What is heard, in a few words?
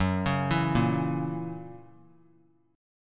F sharp 7th, down; arpeggiated; JGuitar